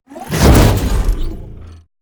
robotic lifeforms whooshes titan angry move sweetner; robotic lifeforms 2; soundmorph